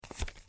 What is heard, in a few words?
deal player; casino